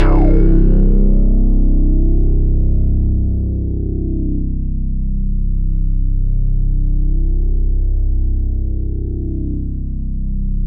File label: C1, Synth Line; 90 s Sample CDs; NiceBeats; SynthLine VOL-30